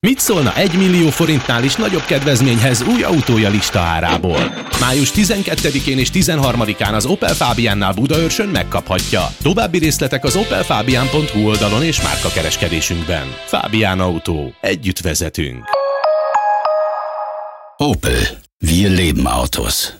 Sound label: OPEL; OPEL FABIAN